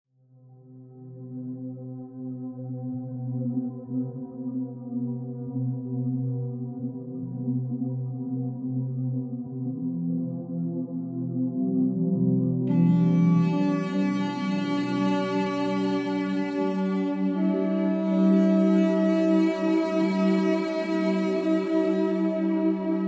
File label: Deep Meditation 864 Hz